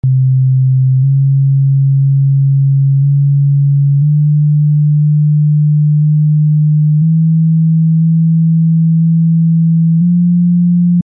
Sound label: Tenori+Basso range 3 mixed ascendant scales 157+138+126 cents; 11 pitch